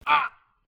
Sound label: hurt